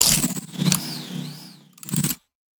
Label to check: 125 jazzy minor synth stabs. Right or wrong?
Wrong